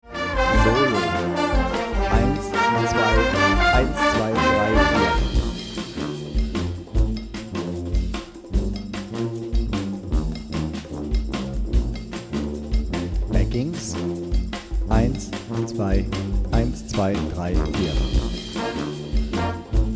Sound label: Playback Brass 150 Soloform